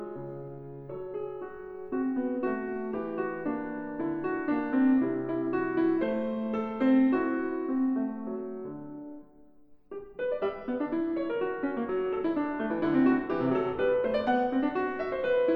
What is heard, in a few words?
Sonatine Nr 2 For Piano; Classical